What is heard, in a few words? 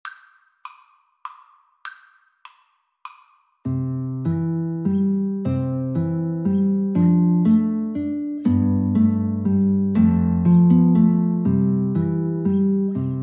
Guitar-Clarinet Duet